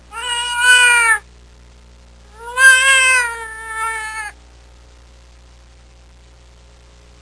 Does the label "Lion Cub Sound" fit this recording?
Yes